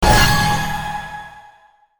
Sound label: glaive; cstrike; war3source; silencer